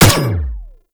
Energy Rifle Shot; Weapons

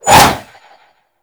Arch Wing Sword Short Whoosh; Warframe

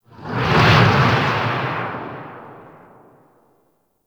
Asteroid Pass, Fortress Ambience; Warframe; Grineer

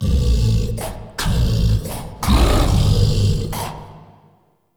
Mirror Defense Start Wave; Warframe; Entrati

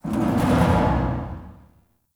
Metal Fall; Ambience; Warframe; Corpus Railjack

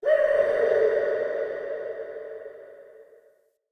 Cave Mysterious Distant C, Ambience, Shrine Cave

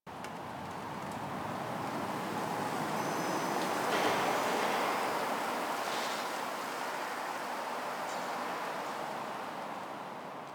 Winter Exterior Small Bed Loop; Nineteen Ninety Nine

